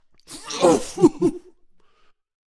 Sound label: Wizard Death Vocals